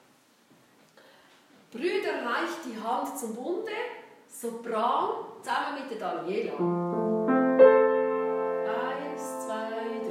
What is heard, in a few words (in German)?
Sopran; Brüder, reicht die Hand